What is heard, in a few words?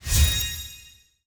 resource combo